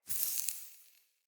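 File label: Staff Caster Projectile Destroy; Weapons; Player; Staves; Starter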